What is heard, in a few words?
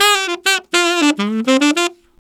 Tenor in F, Tenor Sax Loops; Sonic Foundry (Sony Creative Software); Jazz Solos and Sections